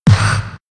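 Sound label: giant cheshire damage, monster; Senillinea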